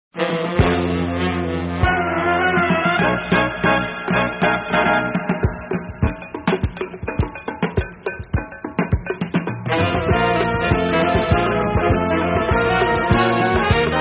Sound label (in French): musique dansante